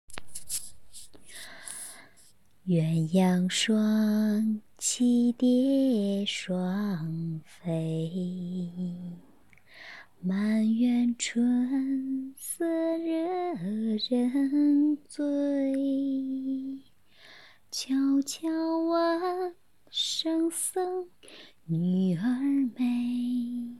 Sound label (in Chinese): 歌曲演唱